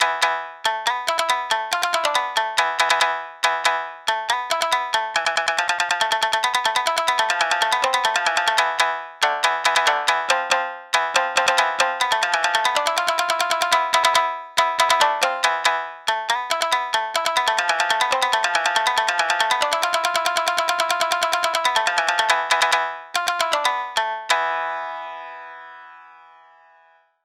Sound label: Illusive Shamisen